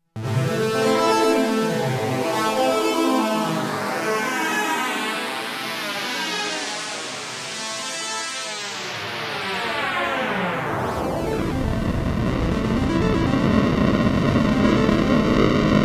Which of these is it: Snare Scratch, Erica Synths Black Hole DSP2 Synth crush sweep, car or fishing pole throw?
Erica Synths Black Hole DSP2 Synth crush sweep